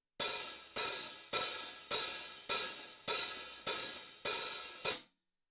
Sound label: 14 HH OPEN, cymbals